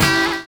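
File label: SAX N GUITAR; Univers Sons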